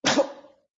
Gag; Lewd SFX

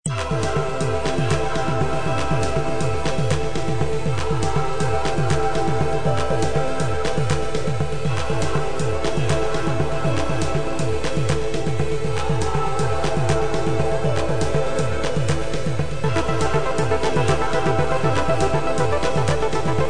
epic intro sample

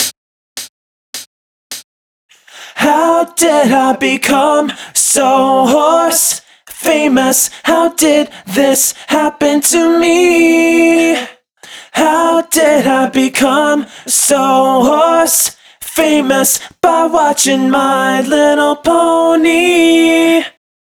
Chorus, 105 BPM, Key of Am, Vocal Stems; Pony Fan Music Remix Stems

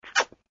Suck; Lewd SFX

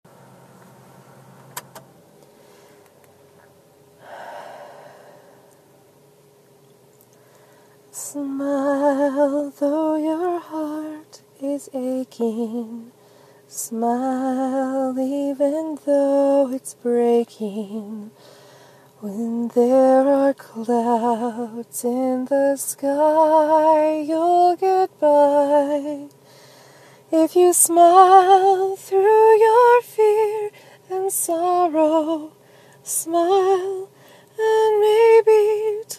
[singing][a capella]; Recordings

